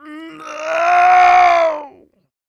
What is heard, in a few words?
A YELL, MALE HUMAN; ILIO; Vocal Planet